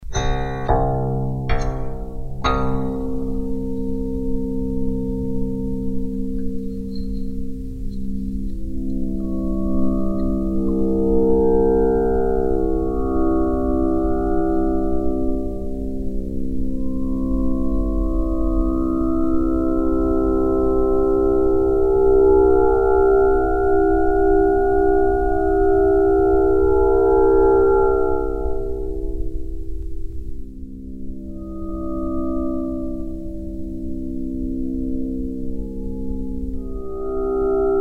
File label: door harp